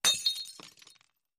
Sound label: Glass Breaks Distant; windows & mirrors